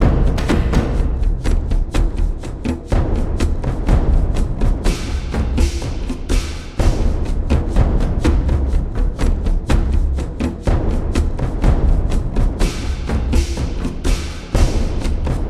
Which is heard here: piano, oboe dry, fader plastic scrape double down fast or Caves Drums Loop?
Caves Drums Loop